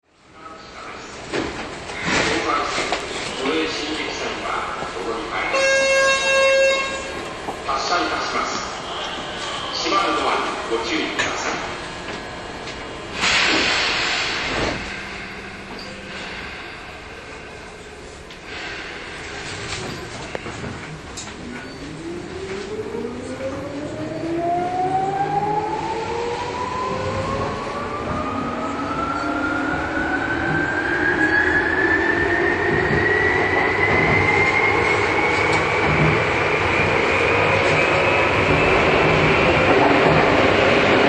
走行音, 5000系; Tokyu; 押上→錦糸町; 6ドア車; 車内はE231系と同一です。

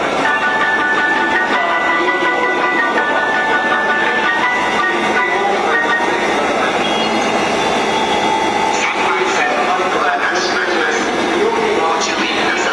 恵比寿駅, 埼京線・湘南新宿ライン; 大崎方面

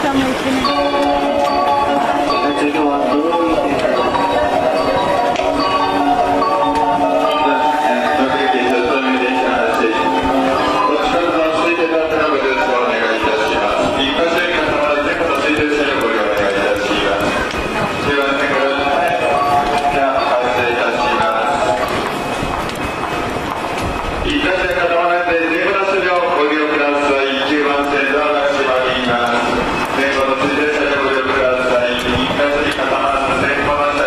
Verde Rayo, グリーン車に人が固まってます。; 宇都宮線; 小山・宇都宮方面

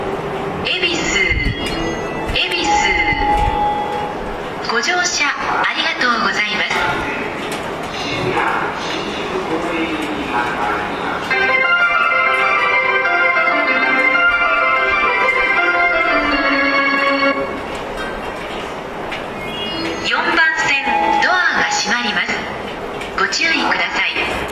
発車3音色a, 恵比寿駅, 埼京線・湘南新宿ライン; 山手線; 渋谷・新宿方面; 期間限定メロディー

